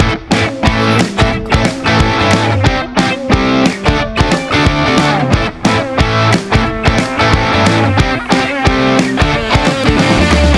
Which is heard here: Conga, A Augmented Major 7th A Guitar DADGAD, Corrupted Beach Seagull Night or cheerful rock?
cheerful rock